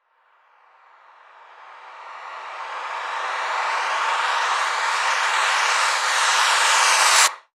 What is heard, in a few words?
FX Uplifter; Mutekki Media; Vengeance Electroshock Vol.2